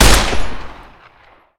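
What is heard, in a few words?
Weapon assault carbine fire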